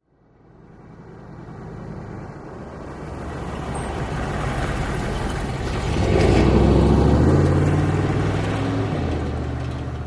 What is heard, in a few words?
Humvee Slow Corner By